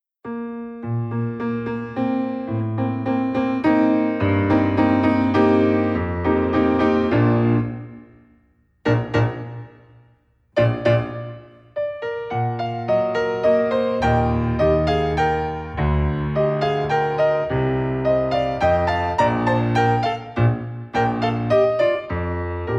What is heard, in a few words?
Accompaniment Bb; instrumental solos